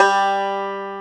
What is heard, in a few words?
BANJO G3, ETHNIC; AKAI S-Series CD-ROM Sound Library VOL-8